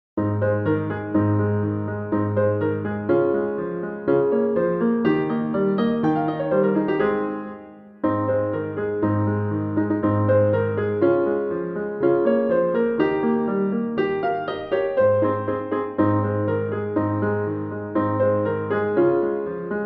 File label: jingle bells